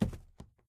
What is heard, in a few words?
phys cube, physics